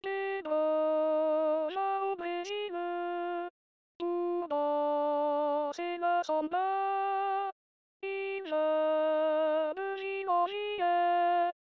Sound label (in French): femmes 2eme voix